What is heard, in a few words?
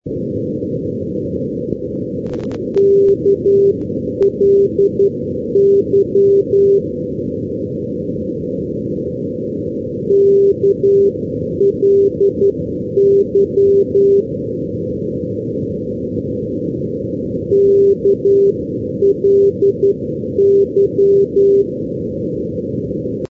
ndb dublin killiney